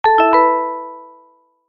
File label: notification